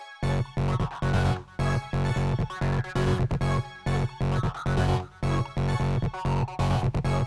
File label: 132 Music Mix; Zero-G Trance Inducer; Construction Kits; 132bpm